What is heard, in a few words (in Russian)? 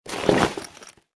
Положил в ранец